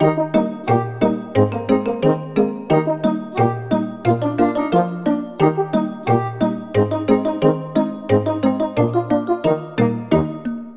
Water Puddles; peppa pig world adventures

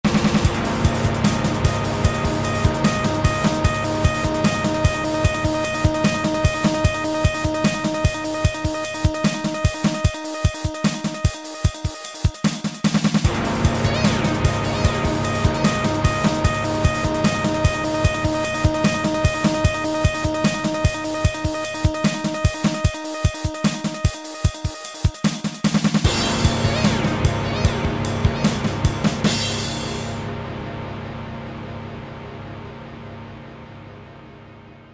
Intense

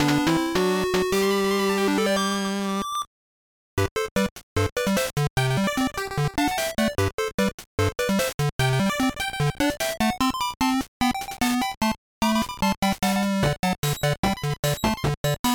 Victory! Line Clear; Pokémon Puzzle Challenge

